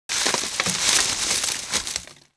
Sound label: Brush Rustle; Environment